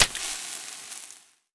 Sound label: Warrior Acid Attack Hit; we are the dwarves gamerip 2016